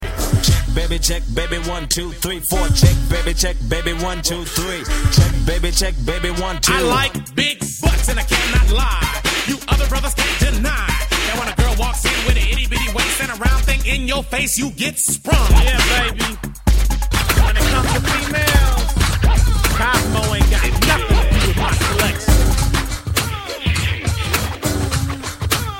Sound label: old school hip hop mix